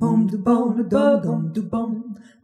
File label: Jazzy Harmony